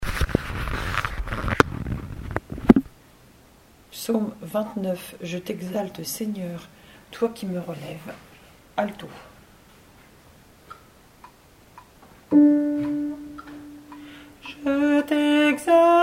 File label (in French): Psaume 29 Je t'exalte Seigneur Toi qui me relèves ALTO